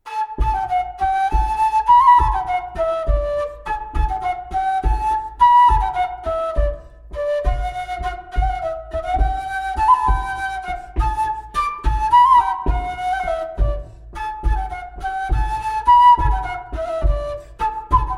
Bourree parisienne montagnarde

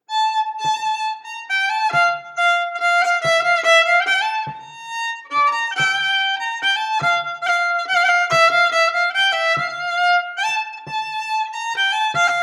Valse

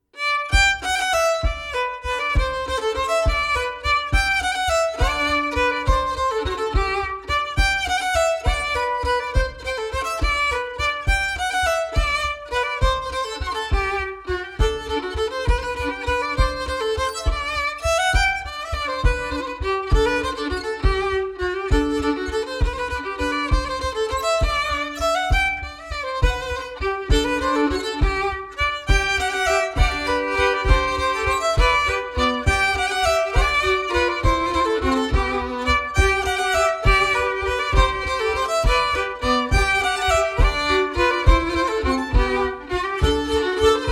Bourree d Auvergne; Bourrees et autres danses antiques publiees par Elie Dupeyrat

